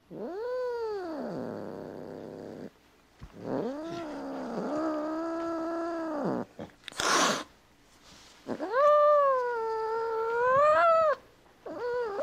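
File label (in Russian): кошка угрожающе мяукает и шипит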